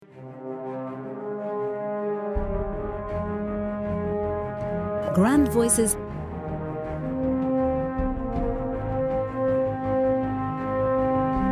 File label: MUSIC FILM